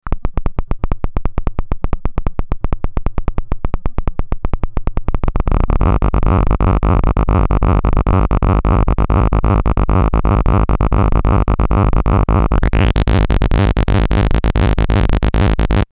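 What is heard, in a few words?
seq mod with moog filter; SAS-VCO-->wavetable-->Moogfilter(with sequencermodulation of cutoff)-->Bergfotronphaser; Soundfiles from the modular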